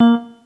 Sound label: Synth